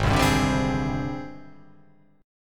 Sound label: G sharp Major Sharp 11th